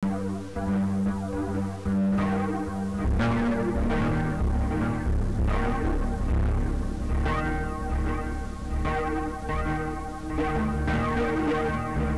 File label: aeolian harp